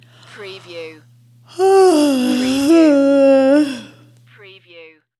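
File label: Female Yawn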